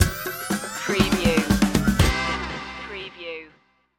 fatboy blues stinger

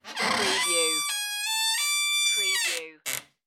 old squeaky wooden door

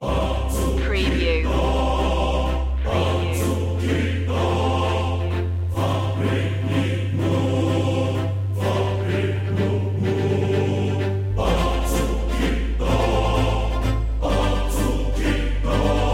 marcato strings choir

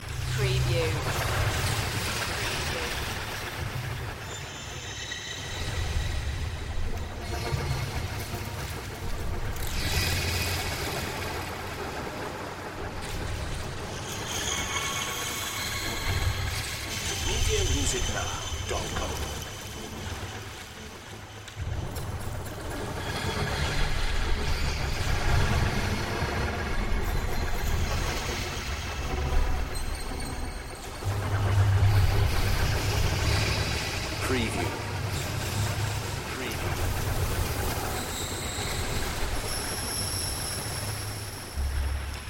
abstract information cloud loop

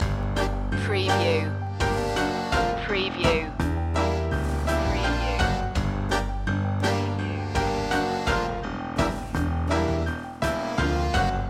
circus tango loop

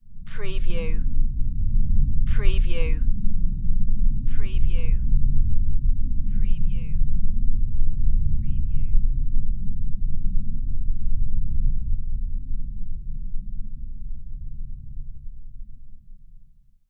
Space Ship Passing By

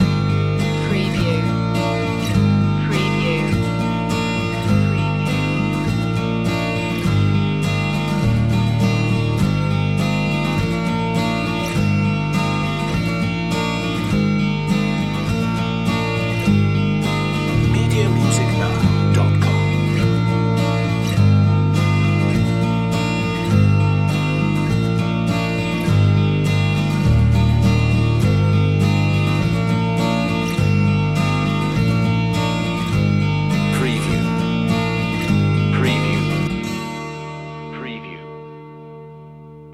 walking along no drums

